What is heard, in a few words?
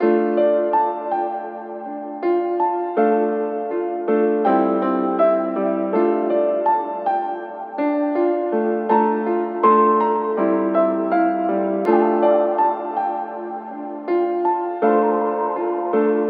piano pain type beat